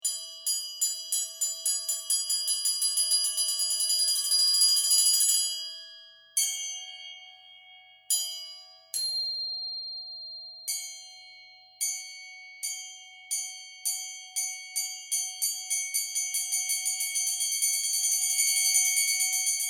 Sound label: bells, marimba